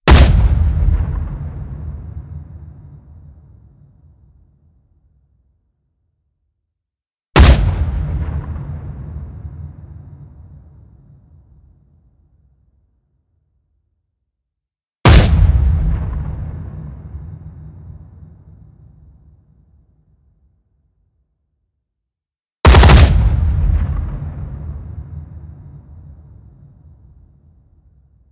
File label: hit gunshot surprise